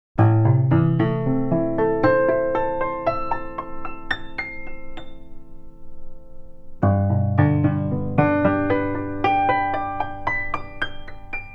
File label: Harp; Tiree